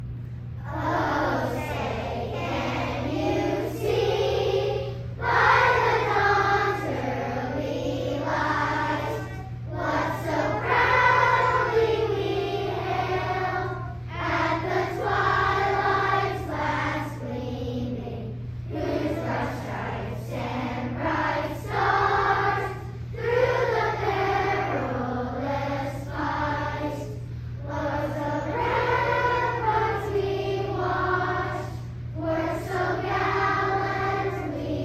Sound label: Joyful Noise